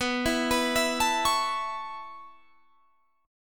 B 7th Suspended 2nd Suspended 4th down Guitar Standard, chord; B7sus2sus4; arpeggiated; JGuitar